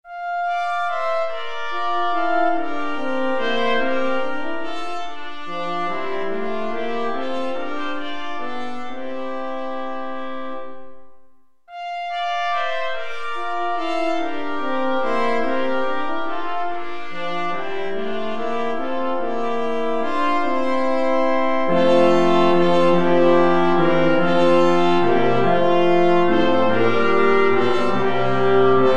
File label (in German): blechblasquintett